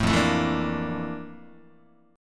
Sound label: F Major 13th Guitar; strummed